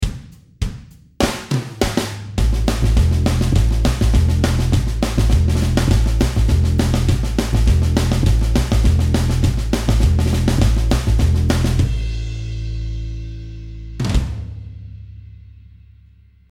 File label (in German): Country Licks Playalong